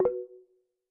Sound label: calls they joined call; Slack; macos